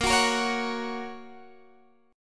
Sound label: A sharp Minor Sharp 5th, Guitar Drop D